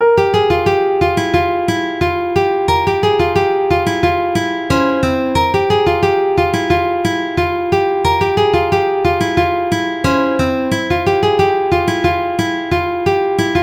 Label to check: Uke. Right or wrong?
Right